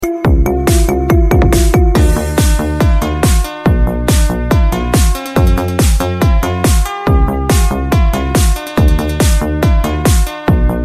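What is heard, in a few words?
bell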